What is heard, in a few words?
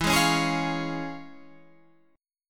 Db Major E; strummed